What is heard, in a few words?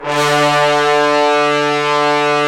BRS, Roland LA Composer; Roland - LA Composer vol. 4; Tbns